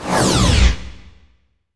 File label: burst discharge change end, weapons